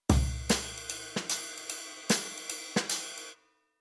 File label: Rhythm Modern Jazz